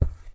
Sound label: carpet Walk